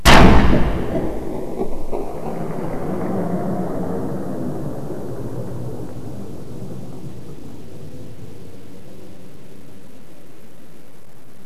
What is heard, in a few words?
thunder c2; Terra Sound Library; construction set